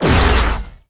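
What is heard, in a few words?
angry buff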